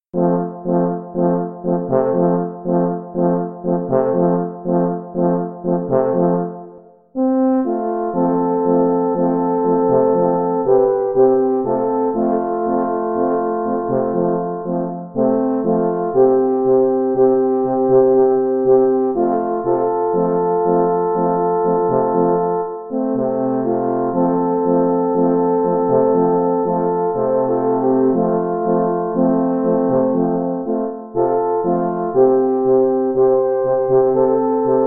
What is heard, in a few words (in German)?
Beim Tango